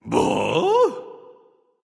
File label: surprise; smite